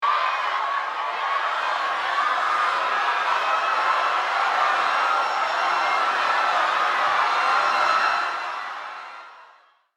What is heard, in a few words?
Panic crowd screams disaster chaos